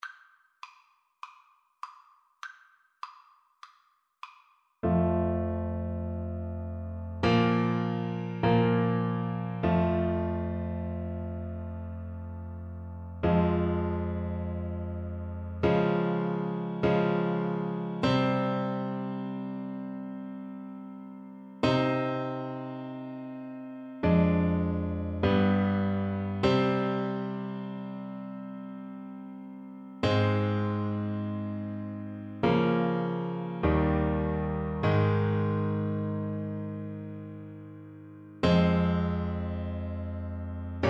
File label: clarinet